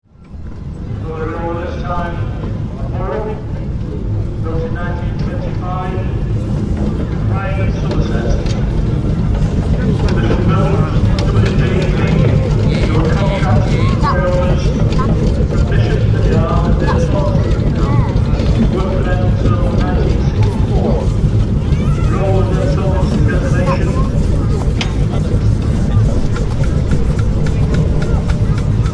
Steam Rally, Vintage traction steam engine parade with PA announcer and crowd.; Trains; Vehicles